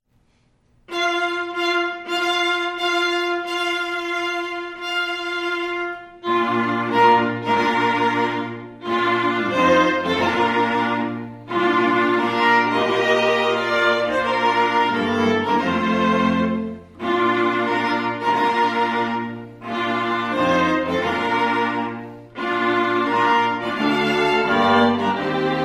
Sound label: WEDDING DAY MUSIC WAGNER WEDDING MARCH Bridal Chorus